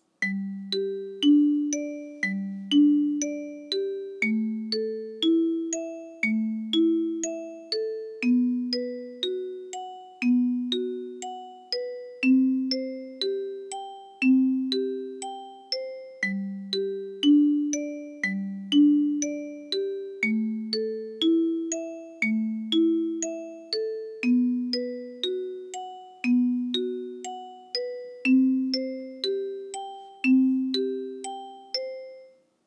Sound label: alto exercise, kalimba